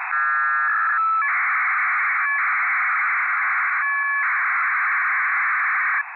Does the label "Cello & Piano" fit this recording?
No